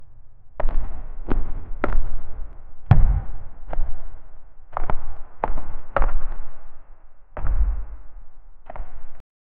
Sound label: giant heavy footsteps; environmental